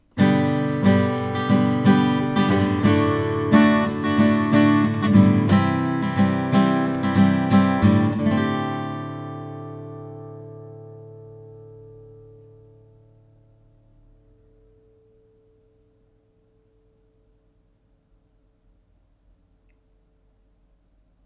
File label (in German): a gitarre, ml53; oktava